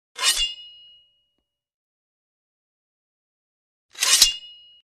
Sword Draw From Sheath Heavy Sword Drawn From Sheath; Hollywood Edge Foley Sound Library